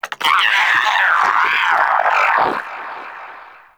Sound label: Vocal Alerted, Shrimp Lantern, Creatures; Baliq